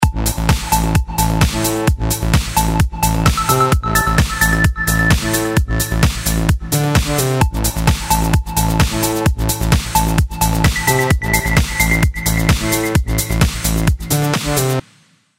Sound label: Ringtone, Samsung GT-C3110; Southeast Asia